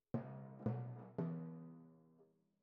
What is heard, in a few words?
Timp Close